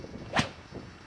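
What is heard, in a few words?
Golf swing With 3 wood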